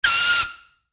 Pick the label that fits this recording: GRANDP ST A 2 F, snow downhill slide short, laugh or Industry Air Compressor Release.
Industry Air Compressor Release